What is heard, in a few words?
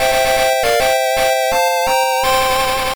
Victory Jingle; bakuryuu sentai abaranger; pico